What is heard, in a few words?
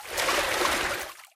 wade, footsteps; Source Engine; video game